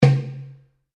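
Drum Darabuka Palm Hit mezzo forte, Egyptian Darabuka Drum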